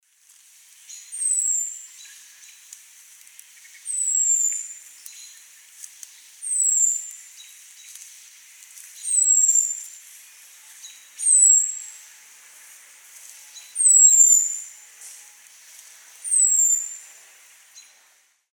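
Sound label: sounds of thrush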